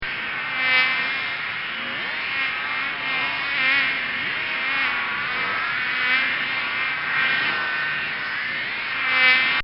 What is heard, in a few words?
Swam Of Mosquitoes; Animals:Insects